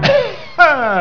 cough

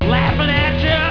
laughin atcha

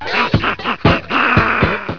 laugh

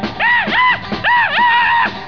screams

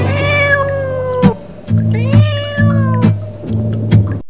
meows; "Mystery Sound Bite" Contest

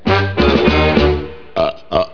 burps

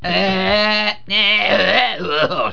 gagging

